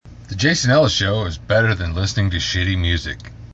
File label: shitty music